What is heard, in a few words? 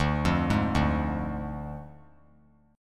D Diminished D; arpeggiated